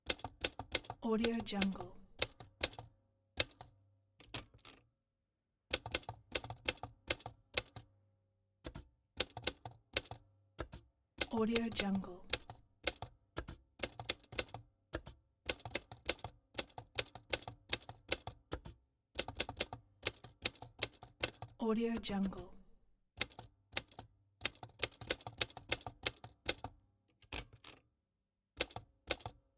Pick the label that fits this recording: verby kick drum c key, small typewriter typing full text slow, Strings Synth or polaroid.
small typewriter typing full text slow